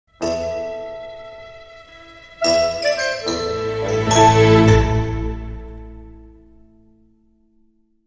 Dramedy Sting, Short

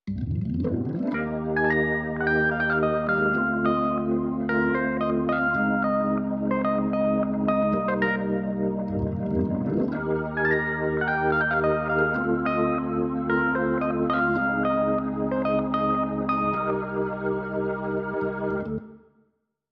Keys

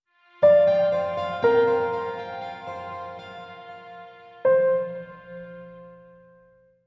Sting

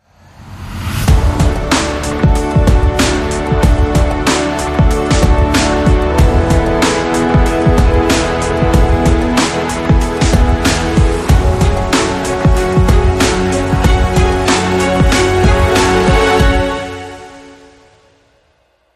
Hybrid Sting, Short

